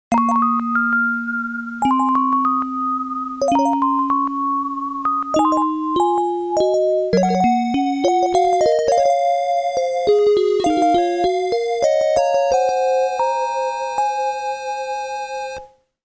Music Box [Game Over]; The Fantasm Soldier Valis; PC Sound Orchestra; Moonlit Night's Music Box

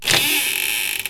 door; Shin Megami Tensei IV Apocalypse; 3DS

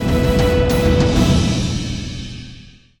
sting battle victory

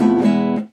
Mariachi Guitar strum; WrestleQuest

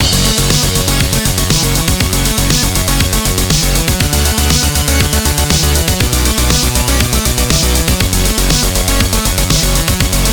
Music Electro; Learn to Fly 3

